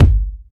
phat steel kick drum sample g key

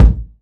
solid kick drum sound a key; kick drum samples

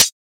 00s mellow closed hi hat sound B key

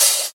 short open high hat sample c key

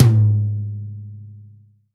long tom drum single hit g sharp

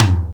high quality high tom drum sound f key; tom drum samples

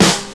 good snare g key

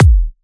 bumpin bass drum single hit f sharp key

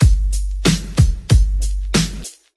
93 bpm g east coast rap drum loop

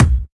studio bass drum single shot c key

